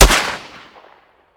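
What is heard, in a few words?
weapon dmr sup fire player atmo ext; gmod; viper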